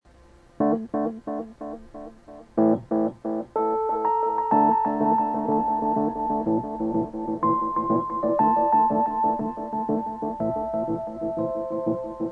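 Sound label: theme on Roland RE201 space echo; rhodes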